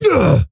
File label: Stonebark Wound; Elder Stonebark